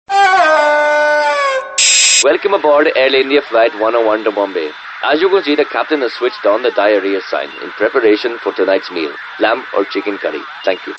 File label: fart and diarrhea